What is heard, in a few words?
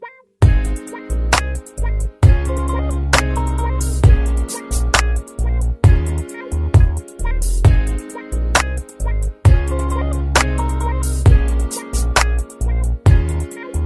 remix